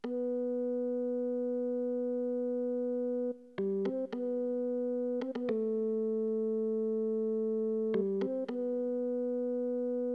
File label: ORG, Hip Hop and R&B; Best Service ProSamples vol.02; AKAI